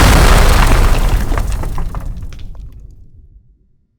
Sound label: Explosion hit stone; Hovl Studio; SFX Battle sounds; WhiteMan Unity2D